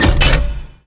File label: Enemy Hourgrass Modechange